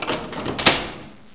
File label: LOCK